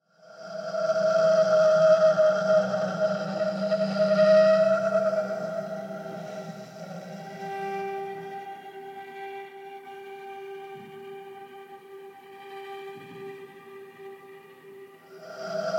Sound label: Noise